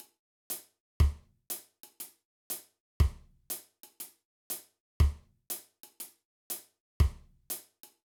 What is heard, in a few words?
Reggae Groove